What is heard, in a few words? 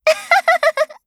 laugh; Eternal Return; Garnet; KOR